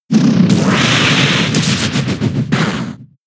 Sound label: Horn Drill; pokemon sfx gen 6 attack moves xy oras